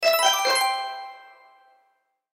Accent Twinkle Strings; Miscellaneous Musical Sound Effects

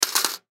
Coin Dimes Drop Drawer; Money (Sound Effects:Objects); Blastwave; Foley

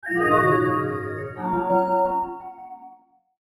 Logo Musical Sweeper; Sonic Logo Sound Effects; Production Element Imaging Element Accent Transition

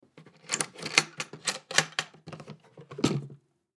Camera Case Unlatch Open; Cameras; Electronics; Blastwave

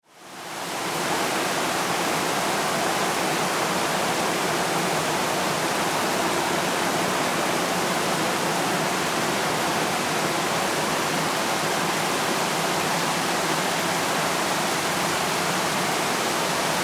Weather Rain Pour Tin Roof; SFX Bible

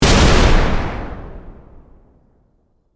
Explosion Heavy; Warfare; Bombs (Sound Effects:Explosions)

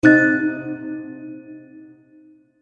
Magic Surprise Chord, musical

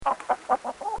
Chicken Laying Egg; Bobby Cole

